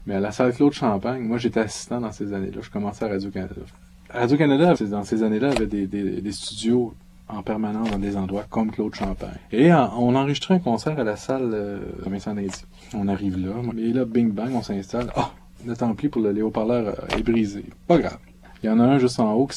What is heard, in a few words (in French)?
concert cloche; Utilisation accidentelle d´une sonnerie d´entracte; studio 12 de Radio-Canada; enregistré en 2006